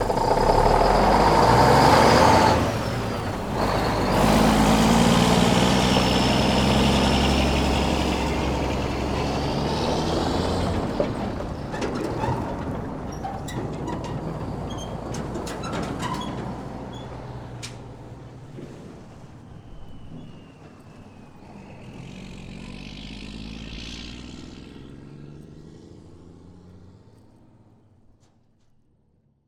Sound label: Semi Truck Away; Large Vehicles; Blastwave